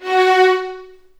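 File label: F#4 violins; The Ultimate String Collection; East West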